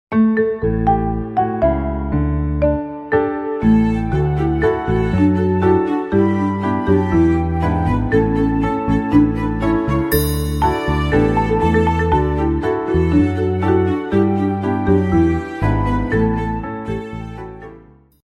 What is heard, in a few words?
Instrumental